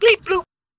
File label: Bleep Bloop; Rvb